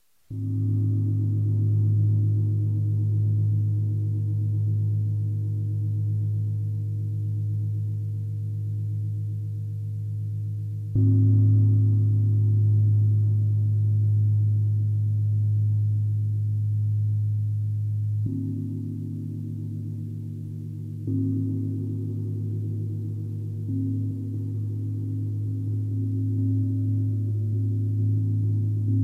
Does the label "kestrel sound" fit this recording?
No